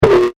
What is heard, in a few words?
Distorted Beep; electronic sound effect; Andrew Fleming